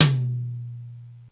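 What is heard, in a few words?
tom mid; xr10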